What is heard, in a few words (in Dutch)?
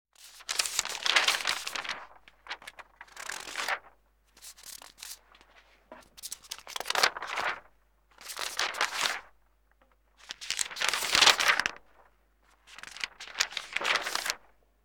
Krant Omslaan; KMP Film Video Sound Effects